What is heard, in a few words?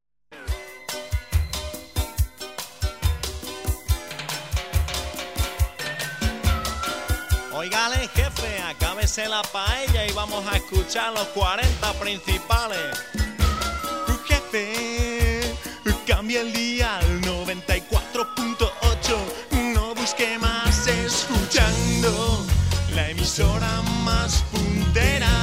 Jingle; 40 Principales; Pingüino